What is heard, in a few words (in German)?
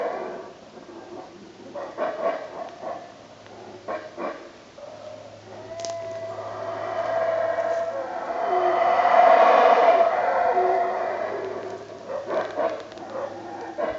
bruellaffe